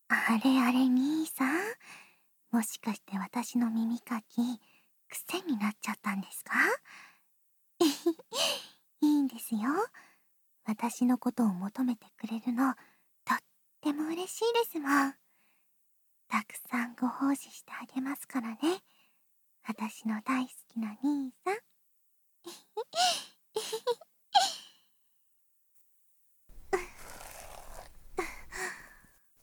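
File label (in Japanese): 吐息耳かき（効果音あり小さめ）; ミミカノ vol4 寧々; バイノーラル美少女耳かき添い寝シリーズ; スタジオ収録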